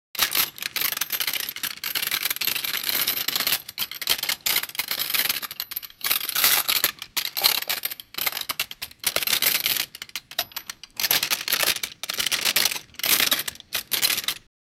the sound of lego pieces, assembly

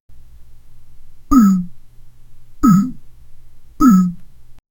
2d game sound of a

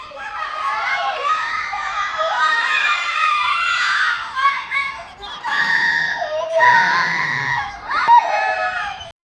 childlike growls giggle

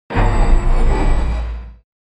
a operating system starti

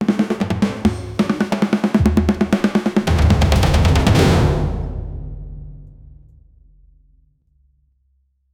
fast paced cinematic drum, tribal

